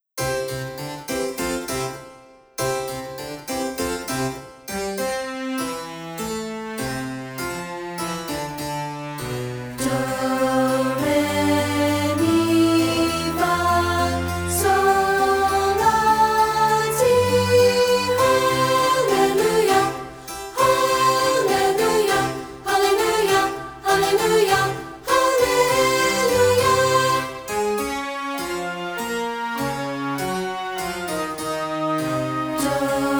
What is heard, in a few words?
Do Re Mi Hallelujah Vocalise; the beatitudes preschool spring